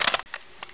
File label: clip out, weapons m4a1; mw2